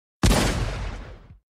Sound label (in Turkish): silah